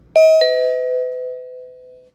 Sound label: doorbell